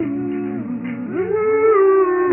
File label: voice; Phonky